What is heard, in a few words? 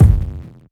reverb kick sound g key